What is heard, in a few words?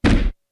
book close; stickler